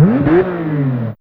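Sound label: Engine Rev, Fairlight CMI IIe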